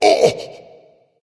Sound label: surprise; cuchulainn